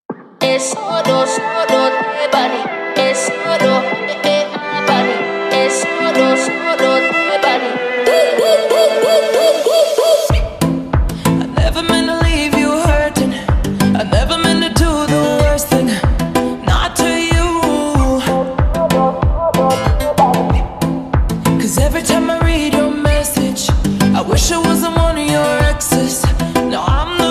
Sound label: slowed reverb